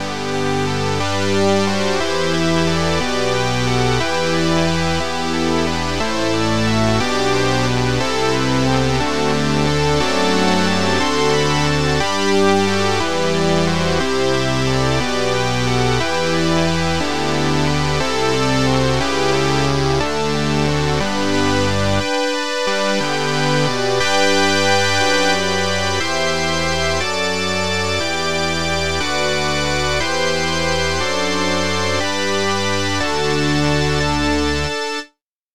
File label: saw wave